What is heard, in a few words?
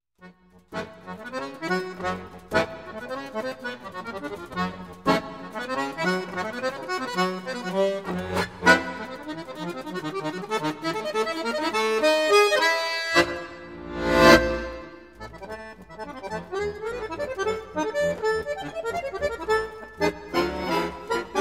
jazz partita